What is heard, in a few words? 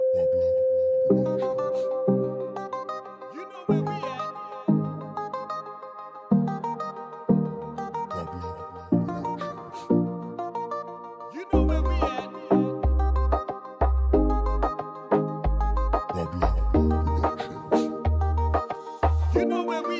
Latin Reggaeton